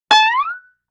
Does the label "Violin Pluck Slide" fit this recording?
Yes